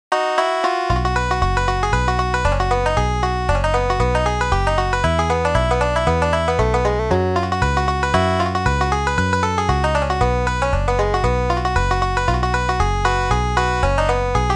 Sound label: banjo; hot banjo breaks 30 bluegrass jam standards